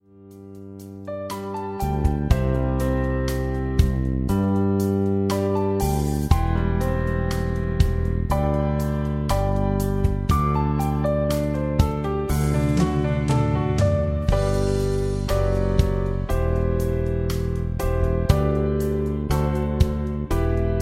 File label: backing track